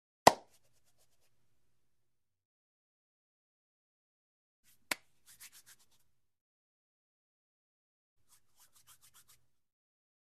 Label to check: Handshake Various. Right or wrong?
Right